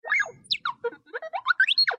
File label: R2d2 Sms